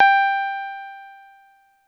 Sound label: reverb hit; radio susan